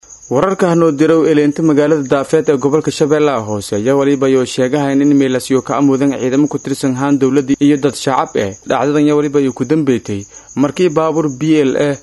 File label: sax